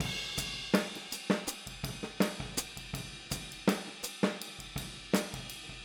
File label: Boogaloo Chorus Hi-Hat, 82 BPM